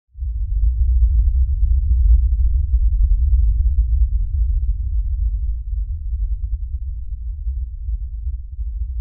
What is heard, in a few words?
fantasy chest open